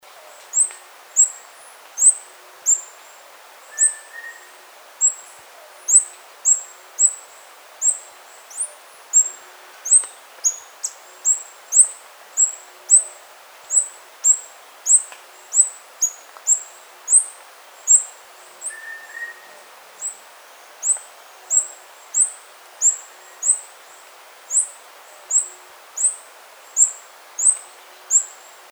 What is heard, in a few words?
wedge billed hummingbird